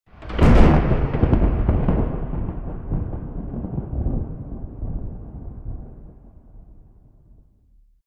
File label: thunder